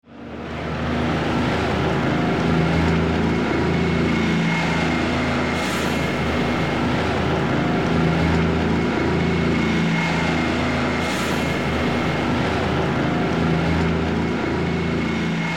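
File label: ambience hitchhike; Wario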